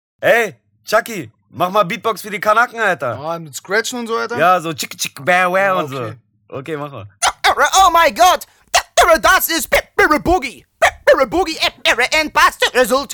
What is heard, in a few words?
Beatbox